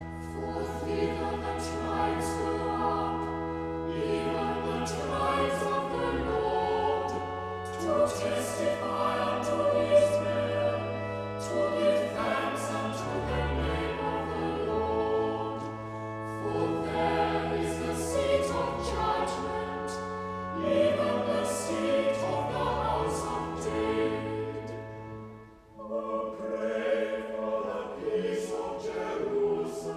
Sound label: Choir